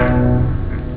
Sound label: organ, synth chords